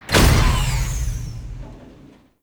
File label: Interior Orbital Station Elevator Stop; Warframe; Tau